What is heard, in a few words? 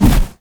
Heavy Melee Swipe, Weapons